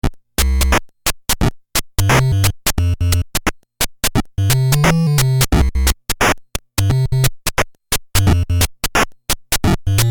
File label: alien waltz